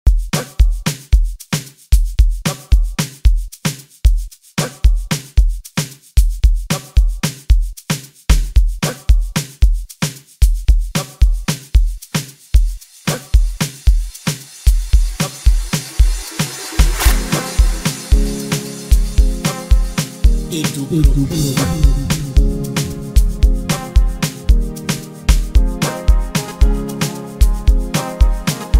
808 bass; hard kick; Eduprobeat; Hard kick by Edupro beat

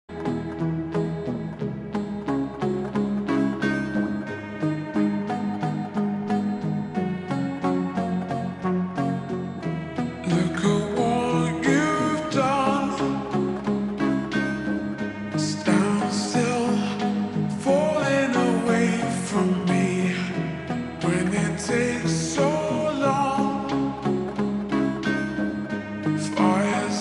ultra slowed reverb

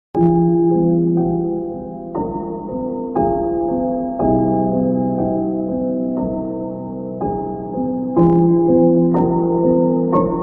slowed reverb

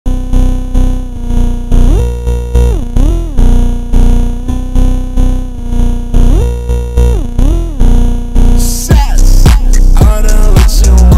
slowed reverbed

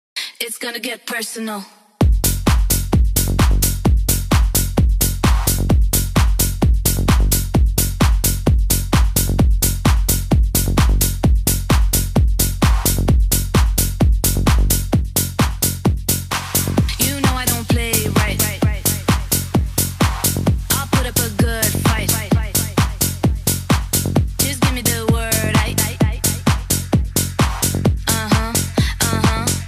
house

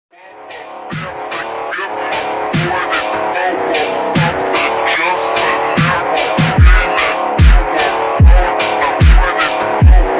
super slowed reverb

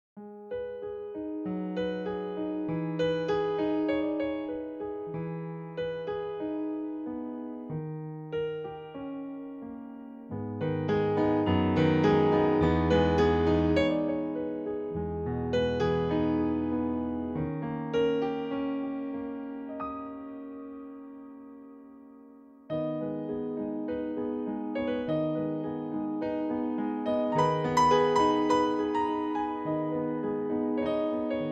piano cover

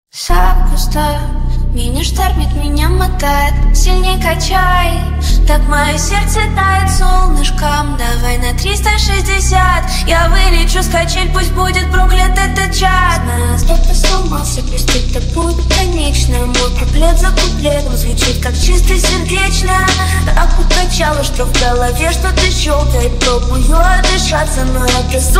hardstyle remix

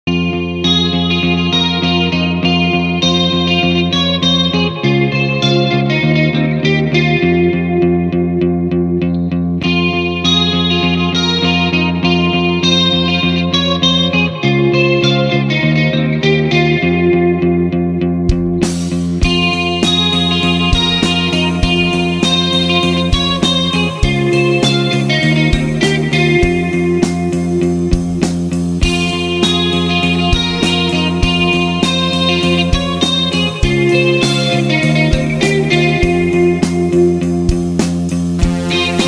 guitar cover

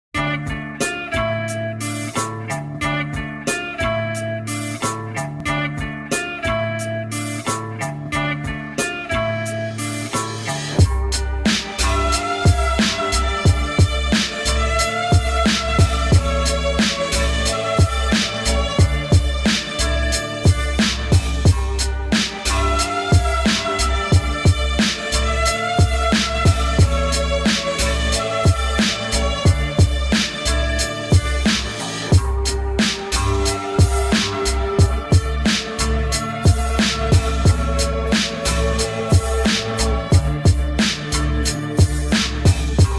beat

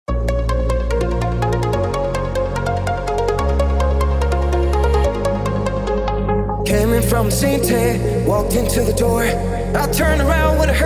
techno remix

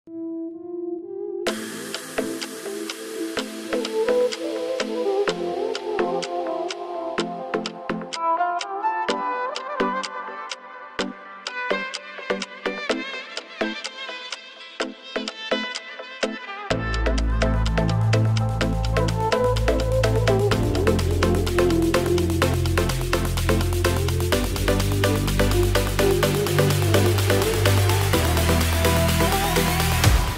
Arabic violin

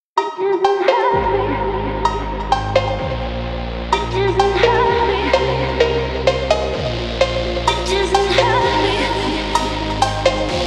phonk

